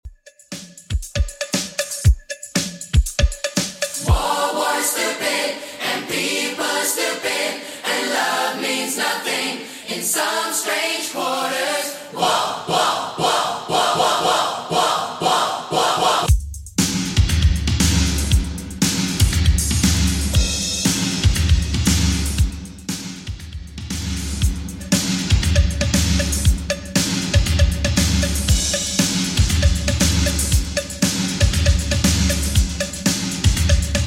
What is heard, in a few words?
80s Redrum, 118 bpm